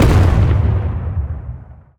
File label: Railgun